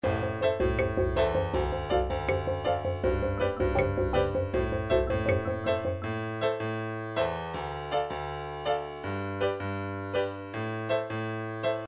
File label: midi drum patterns drums rock; midi drum patterns vol 1 to vol 4